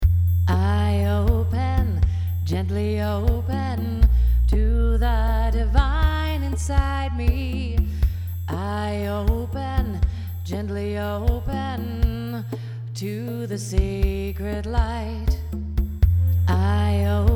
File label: imbolc chant